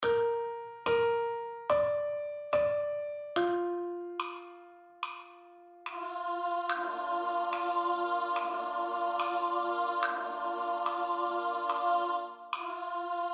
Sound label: ALTO STUDY